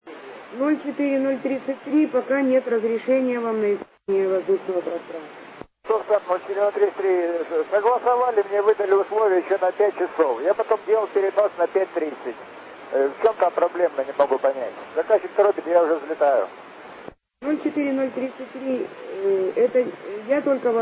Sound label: eurocopter